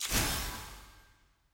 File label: ui platinum burst